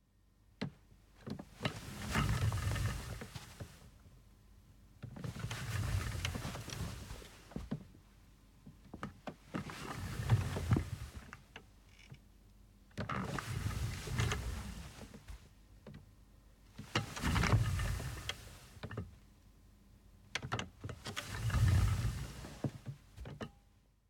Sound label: Modern Seating; TheWorkRoom Audio Post